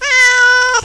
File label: miaow